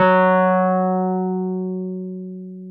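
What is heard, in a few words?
Wurlitzer electric piano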